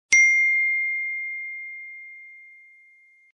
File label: iPhone Notification Sound